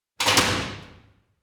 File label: prison; Inquisitor 2